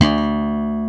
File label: SLAP BASS; E-MU Formula 4000 Series Vol. 3; Analog Odyssey; VK+ Instruments